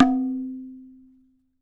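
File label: TABLA left; WorldSounds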